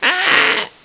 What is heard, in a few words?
slave pain; svencoop; aslave